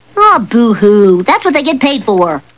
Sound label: boo hoo